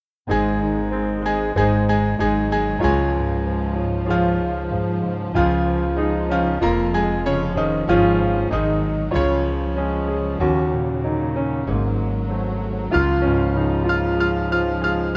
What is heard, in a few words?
Tomer Piano; God Be With You; Verse